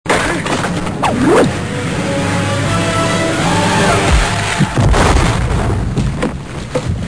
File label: thunk; breaks branch and log falls on; Dutch breaks branch and log falls on Predator's head